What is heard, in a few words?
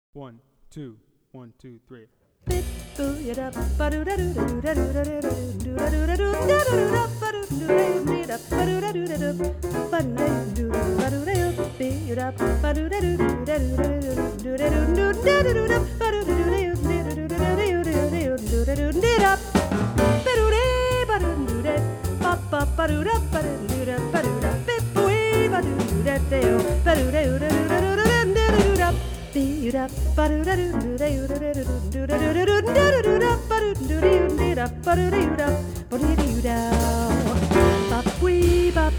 Passage, Solo Etudes Saxophone; Jazz Conception For Saxophone 21 Solo Etudes (Eb Saxo)